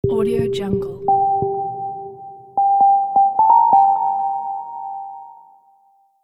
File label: astral meditation bells